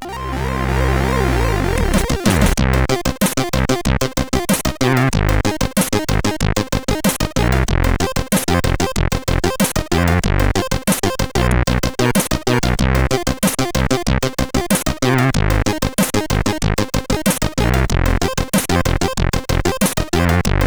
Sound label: Scream, CSG8580R5; hvsc